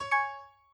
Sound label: message new instant; sound-theme-freedesktop